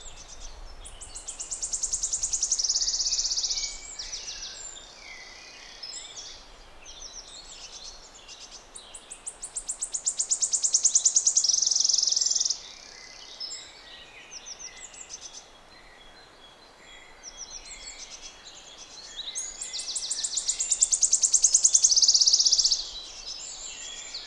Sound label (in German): Waldlaubsaenger